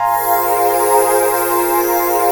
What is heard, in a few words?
PAD, Hip Hop; E-MU Formula 4000 Series Vol. 1; Hip Hop Nation